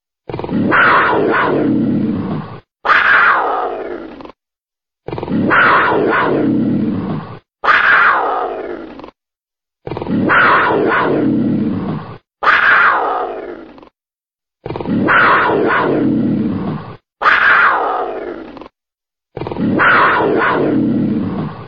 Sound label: Bobcat; Kentucky Fish Game